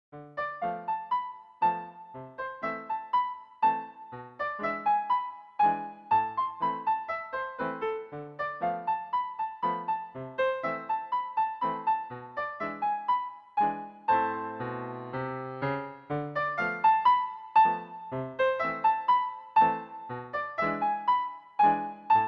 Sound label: Two Guitars